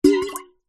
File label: bottle metal drink containing water bash against table; food drink; zapsplat